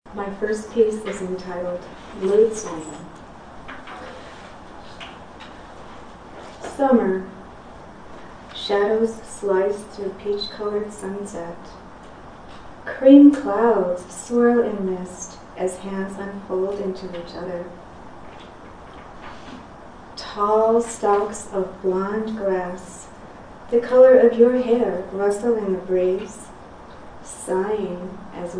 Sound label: Lute Song